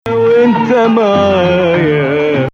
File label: Nahawand